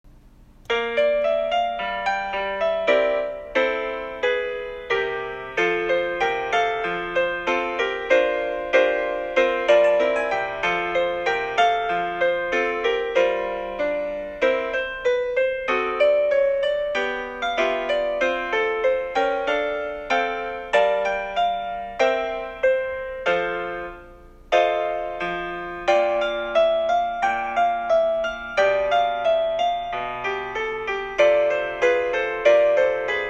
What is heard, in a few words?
accompaniment